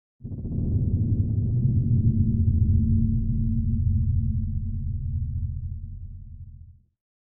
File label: Deep Bass Rumble